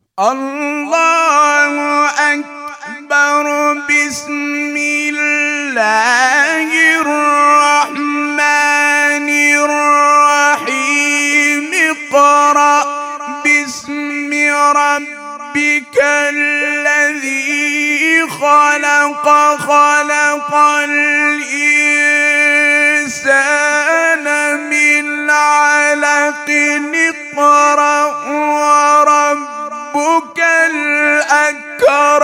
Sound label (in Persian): مقطع زیبای ابتدای سوره مبارکه علق